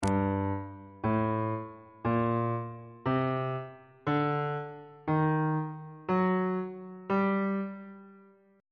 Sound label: f double sharp harmonic minor scale on bass clef